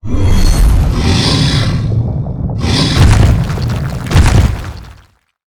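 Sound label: boss Sphinx spawn, monster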